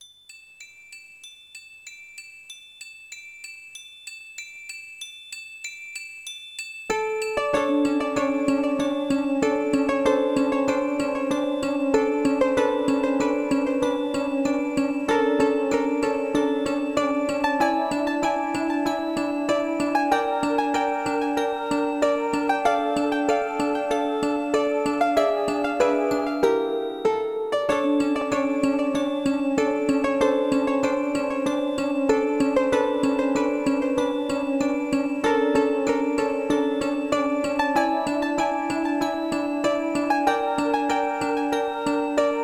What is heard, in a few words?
Main Menu (LAA)